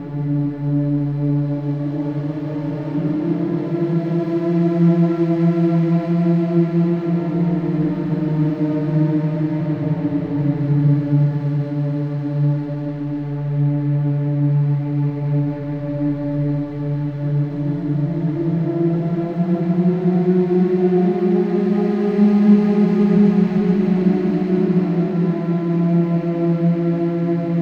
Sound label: Low Drone Flute Loop; Drones Abstract